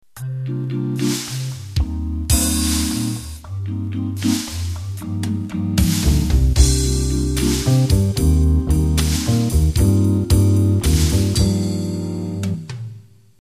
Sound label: Jazz Vox Intro